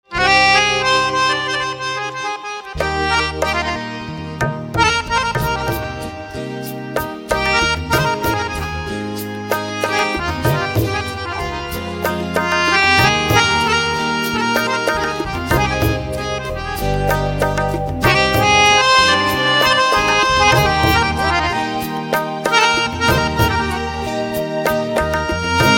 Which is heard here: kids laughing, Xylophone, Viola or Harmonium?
Harmonium